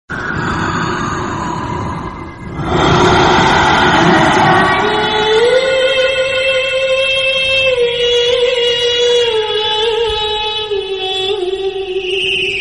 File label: Hindi Horror